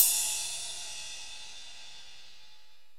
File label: CYMBALS; OMI - Universe of Sounds